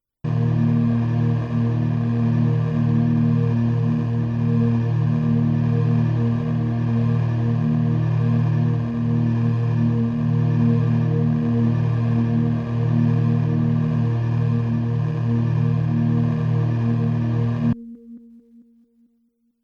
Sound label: Eb drone choir